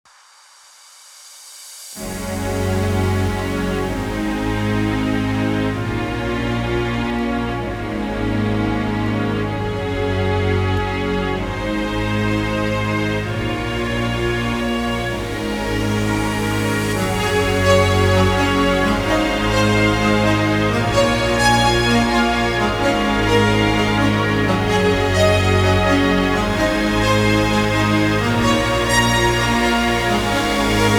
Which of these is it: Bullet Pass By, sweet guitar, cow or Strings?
Strings